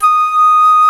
SOLO WINDS

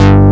mini bass G0; CMP - Analogon

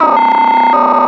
FX, attacks

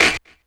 snare, Hardcore Breakbeat

